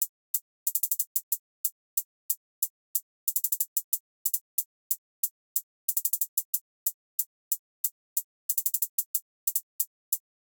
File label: Drum Loop - 92 BPM Hihat; Loop Stems; Cymatics; Vibes II Drum Loop Collection; Freefall Drum Loop